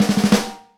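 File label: snare stroke hi; E-MU Producer Series Vol. 8 – Platinum Phatt; Live Snares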